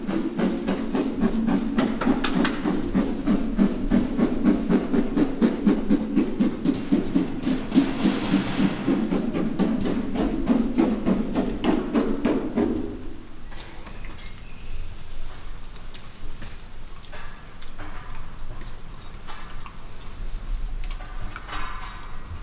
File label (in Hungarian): területjelző hang a másik csimpánz eszik; pecsi zoo 2017